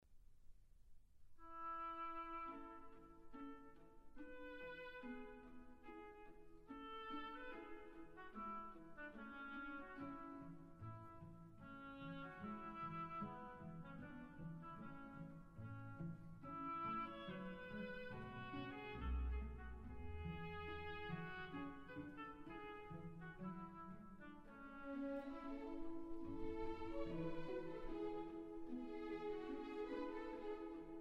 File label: Symphony No. 3 II. Tempo di Menuetto. Sehr massig